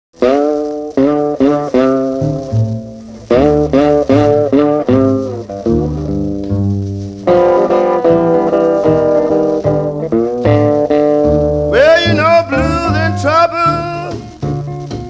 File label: whistle; usa